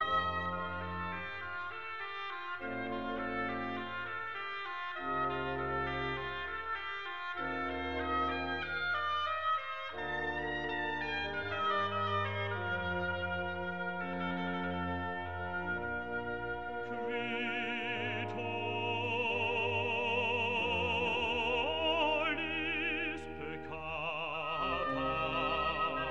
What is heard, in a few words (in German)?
Messe g-moll, mass in G minor; bwv 235